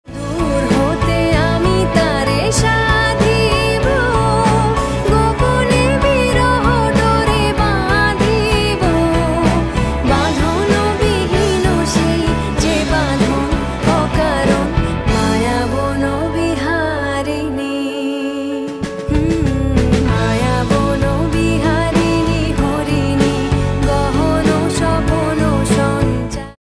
maya bono biharini horini song ringtone rabindra sangeet ringtone